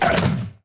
enemy specter death

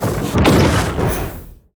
tower pandas skill fire

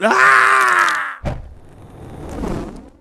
vampire boss death; halloween

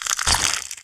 alien egg open

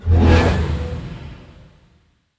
spell cast no laugh; malicia

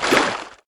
tentacle spawn

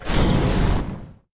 dragons hero attack; sfx

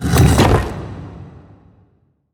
spiders bossfight claw open

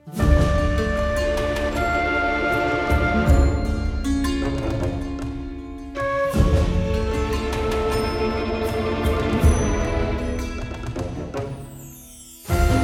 bg music preparation

